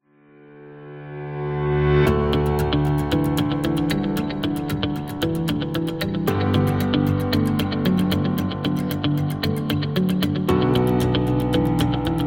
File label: Corporate Inspire; corporate music vol 10